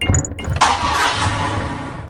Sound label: Rad Mech Wake; lethal company; ambience; windows